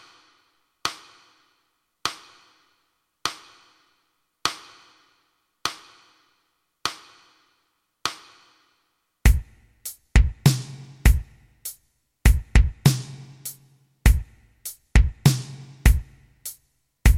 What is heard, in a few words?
rock 50bpm, rock rhythmen